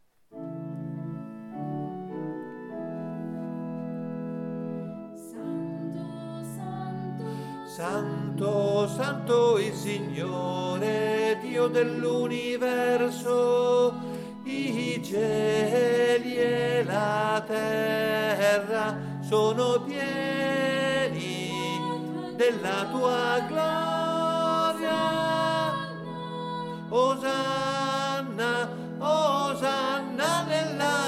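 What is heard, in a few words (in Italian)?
Santo, tenori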